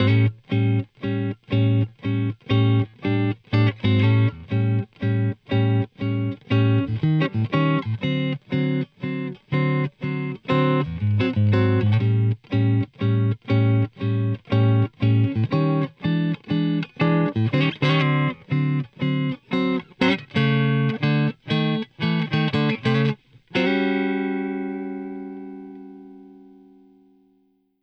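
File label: Blues Guitar; Ending; Digidesign; Pro Tools Essential Loops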